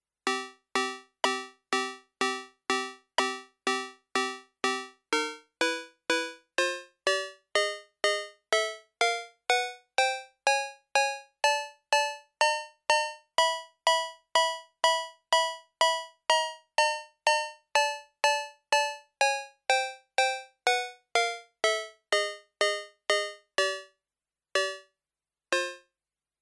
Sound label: MFB Tanzbaer Cow Bell